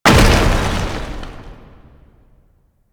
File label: Containment Protocol Impact; Warframe; Warframes; Qorvex